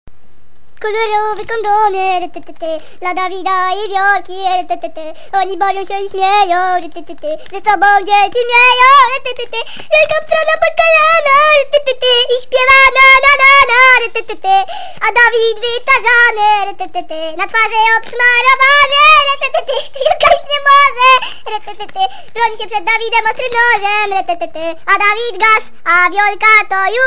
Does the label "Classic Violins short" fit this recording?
No